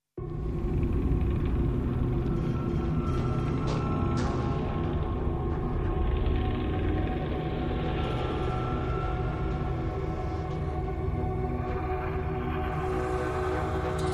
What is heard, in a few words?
Drones Only